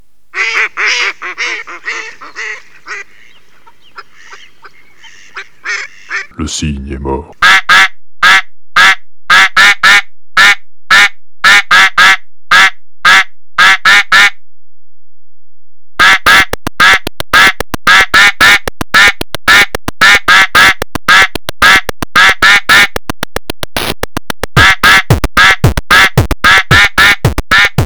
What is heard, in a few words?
jingle